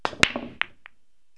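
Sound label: break, 9ball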